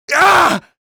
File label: rebel pain; svencoop addon; playersounds